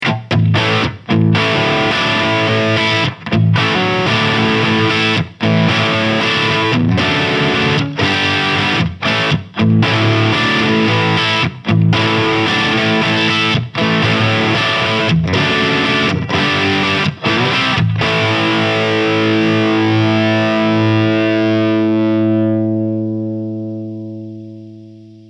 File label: Hughes Kettner BS200 Floor Crunch